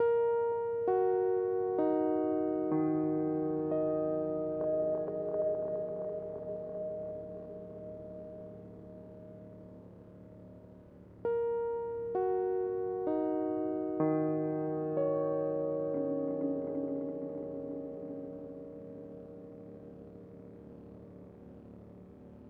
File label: outside airship theme